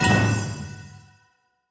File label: upgrade limit Pushing trigger